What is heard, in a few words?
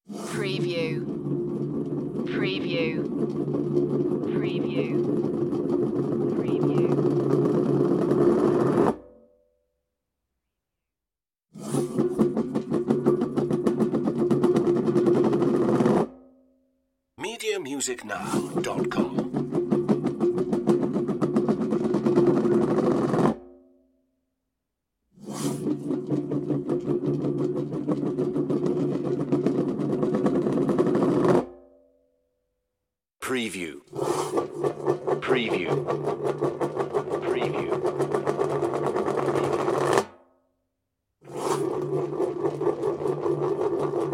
tin drops turning